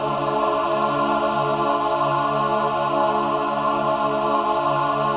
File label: LC MIN D4, Symphony of Voices, MIN.CHORD